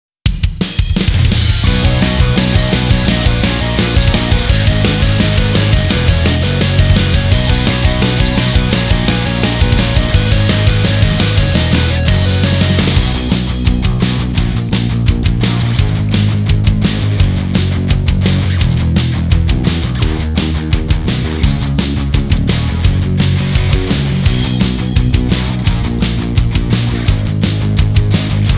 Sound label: (Rock Version Karaoke); Y Prawf Clwyd